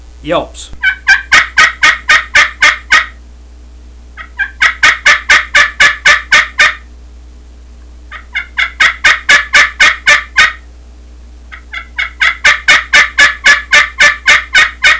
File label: yelps, turkey; canecreek; cane crk mill rose